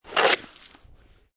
Snow And Ice Ice Skate Slide With Ice Particles

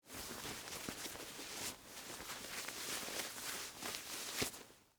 Dress Shirt Roll Up, Sleeve; Foley, Clothes; Dressing; BlastWave

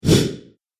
Fastest Deep Whoosh; Whoosh Sound Effects

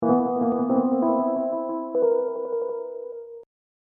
Hip Hop Dark Rhodes Licks 90 Bpm Straight E; Keyboard Loops; Lynne Music

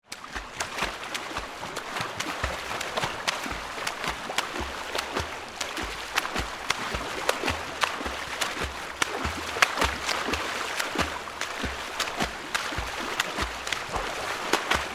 Pool Indoor Swim, Water, Splash; Pools (Sound Effects:Backgrounds); BlastWave

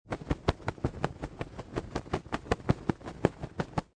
Wings Flapping Bird; Bird Sound Effects; Animals; Lynne Music

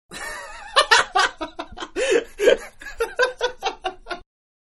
Laughing Male; Laughter; Voice; Manhattan Production Music

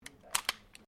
PISTOL TANFOGLIO 38 SUPER FOLEY; Handguns, non military; Pistols (Sound Effects:Guns)

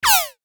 LASER BURST; Multimedia; Lasers; Blastwave

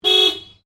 CAR SUBARU LIBERTY HORN LONG; Family members Subaru; Subaru Liberty 2001

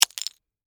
Icicle Drop Hit, Ground, Small; Weather; Snow (Sound Effects:Weather)

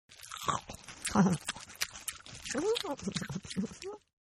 Eating Noisy Female; Human

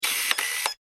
technology camera lens auto focus nikon f4; Cameras; Electronics; McKinney Sound

